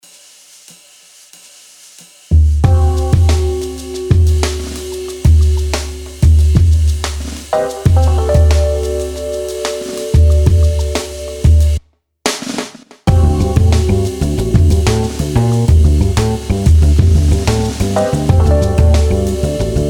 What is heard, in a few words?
instrumental